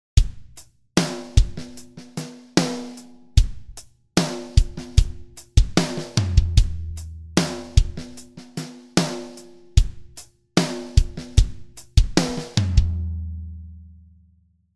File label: Drums Dry